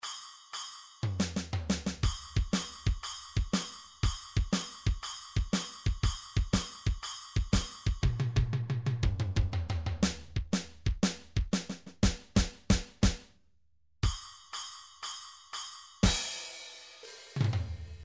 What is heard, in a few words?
midi drums, shuffle